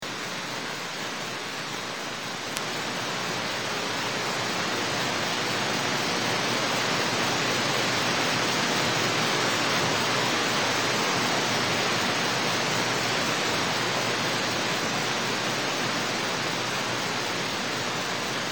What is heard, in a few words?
solar burst 20mhz